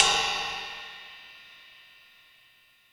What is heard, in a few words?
ride, Yamaha DTXpress Effect; Effect 1